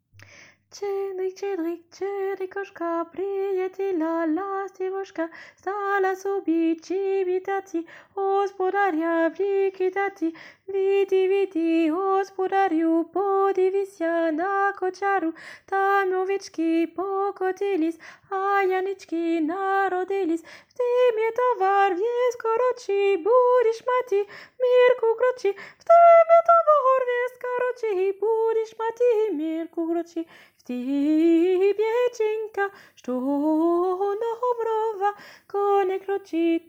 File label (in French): soprane (mi mineur); schedryck